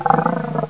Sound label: puma, idle; wanted